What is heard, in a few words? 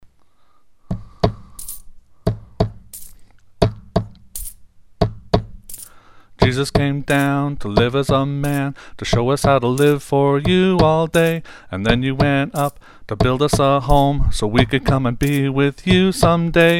yell